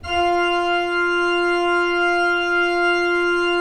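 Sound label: ORG F2, KEYS; Synclavier Sampler Library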